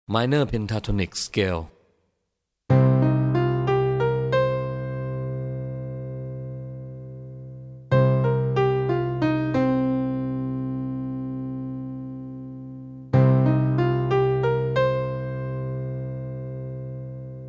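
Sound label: minor pentatonic; songwriting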